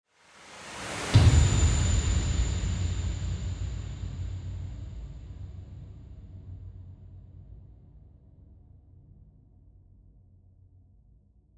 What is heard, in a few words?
Star Shot Projectile; Cave SFX